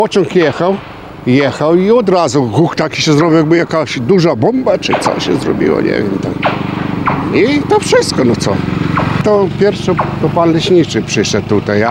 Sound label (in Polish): pociąg